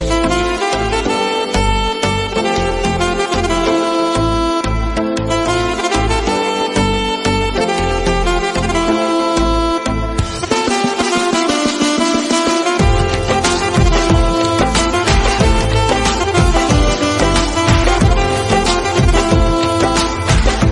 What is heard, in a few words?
Instrumental Ringtone